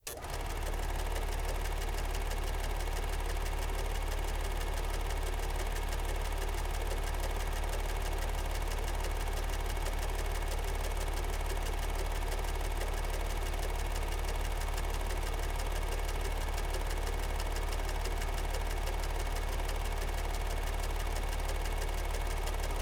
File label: Projector Film Slo Mo; Poetry Project